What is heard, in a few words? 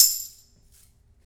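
Tambourine, Lounge Single Drum Hitz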